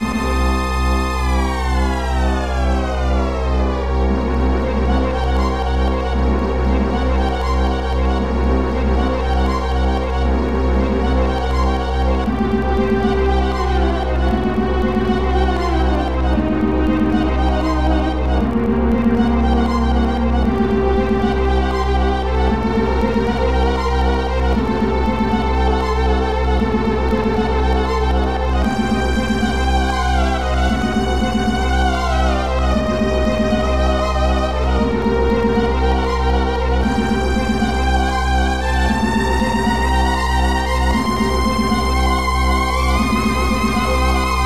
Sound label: Battle with Tuba; violinist of hameln 1995 snes